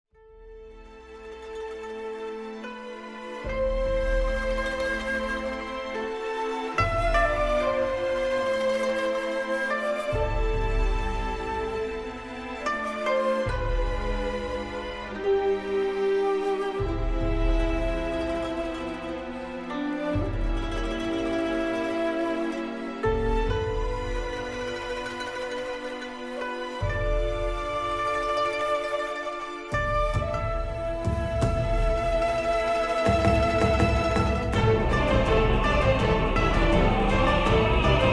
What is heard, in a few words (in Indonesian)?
Nada Dering Anime; Dororo